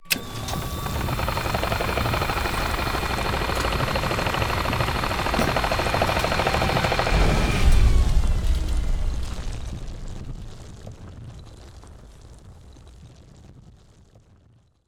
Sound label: ship drill